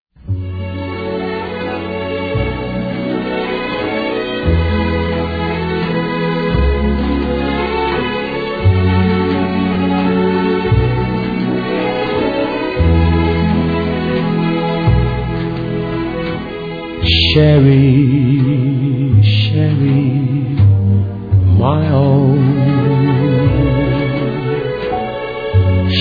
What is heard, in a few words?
Waltz 28 bpm